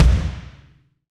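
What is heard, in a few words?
BD, kick drum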